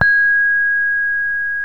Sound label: hi gangsa mid, gamelan; gravityharps